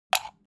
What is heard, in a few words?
object household socket plug